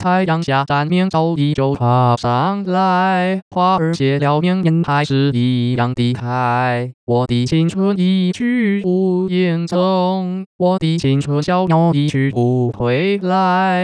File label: Young Dancing, male